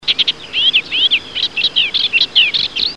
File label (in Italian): Averla capirossa, uccelli